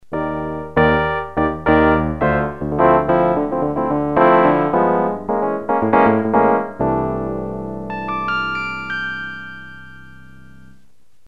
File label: El. Piano